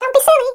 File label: Incorrect